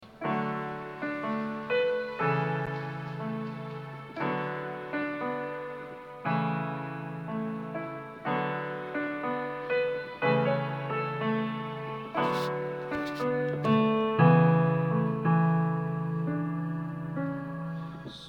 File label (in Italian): Su questo altare (ten); canti; registrazioni